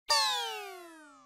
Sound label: funny effect